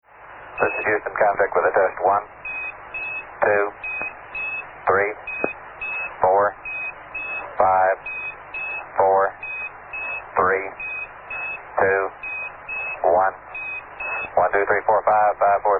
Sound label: quindar